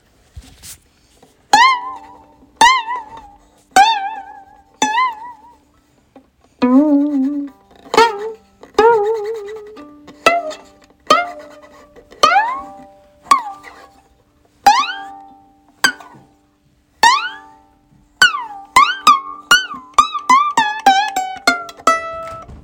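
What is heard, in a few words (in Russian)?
странности скрипки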